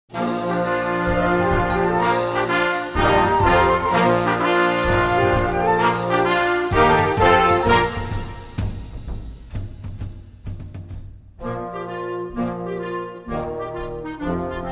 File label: Irish Jig